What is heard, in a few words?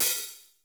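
open hi-hat, DANCE KIT; 90 sSampleCDs; Crypton - DrumBase5000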